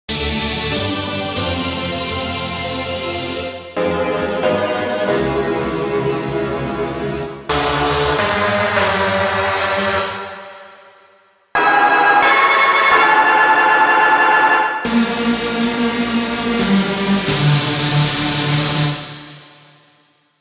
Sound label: Rave Stabs Long